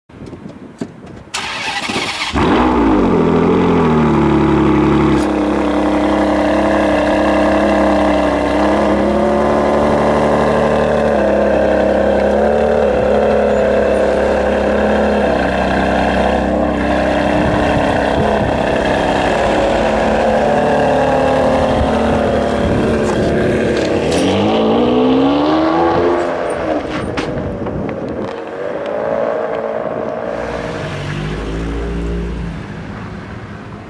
Ferrari 599 GTB Fiorano

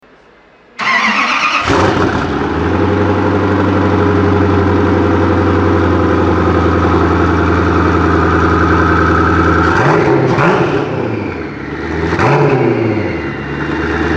Koenigsegg Agera

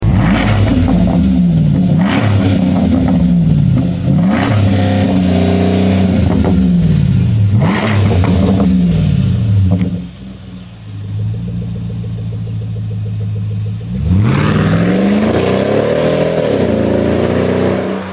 Range Rover Sport HSE

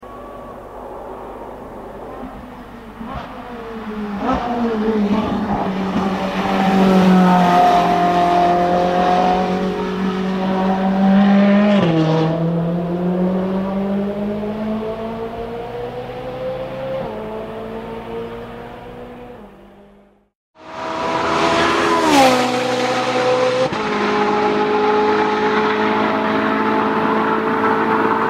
Ferrari 458 Italia

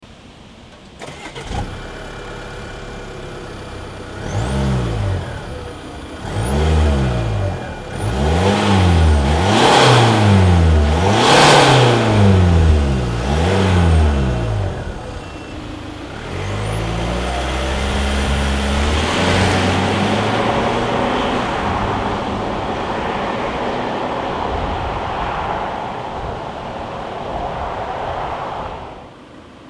Land Rover Discovery Sport

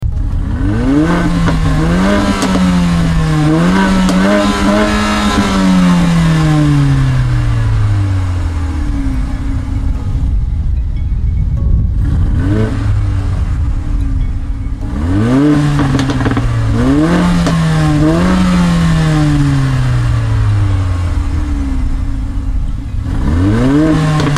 Mercedes GLA45 AMG; cars

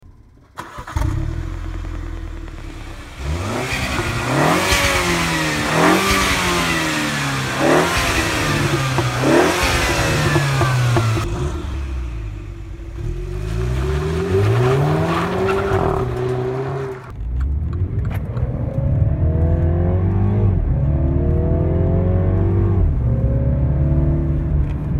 MINI Cooper Clubman S

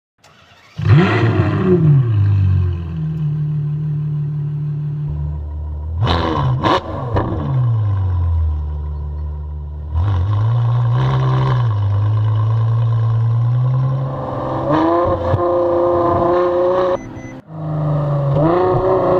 Ferrari F12 Berlinetta

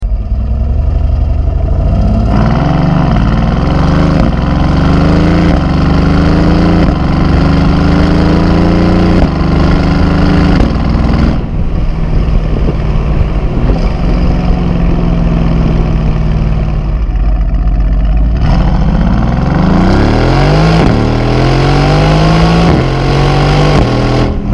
Mercedes GT

